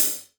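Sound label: open hi hat, Real Drum Kit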